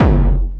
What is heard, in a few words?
bombo, kicks, Hardcore